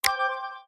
free spin click; golden wheel; City Verse Tycoon

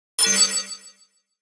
powerup

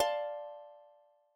chord

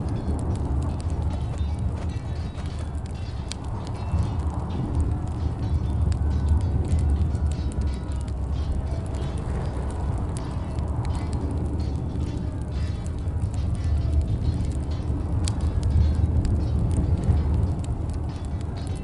ambient sounds, warrior; Heroes of Holdem

